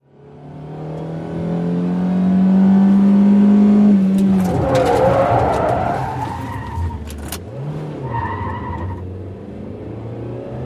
Sm Car 180 Rev Skid In, compact

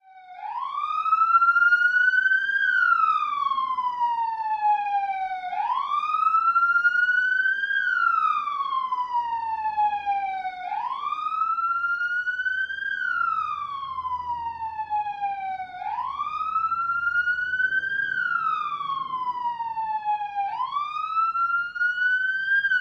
Police Siren: Various Types From Idle Car.

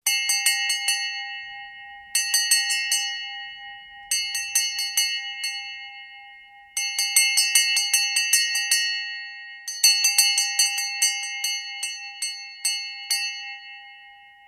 Bell Small Bell Being

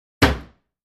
Locker Room Wooden L, locker

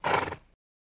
Horse Blow Soft

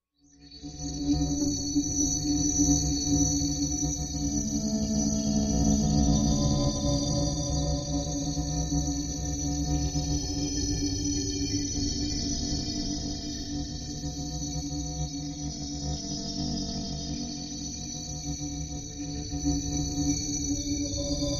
Elect Swamp Elect Night, eerie ambience

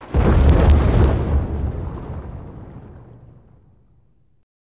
Subway Goes Aground, rails & rumbles

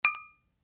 Bottles Clinking; eating & drinking

